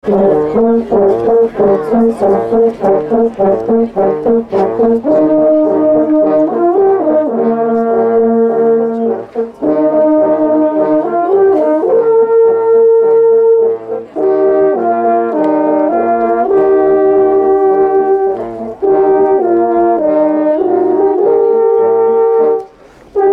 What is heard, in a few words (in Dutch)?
jachthoorn